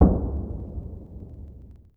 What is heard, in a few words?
distant gun 105mm howitser; WAR